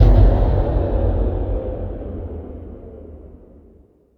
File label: Hits & Explode